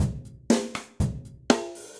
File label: Brush Sizzle, drums kit; Standard Kits; Ableton; Factory Packs; Session Drums Club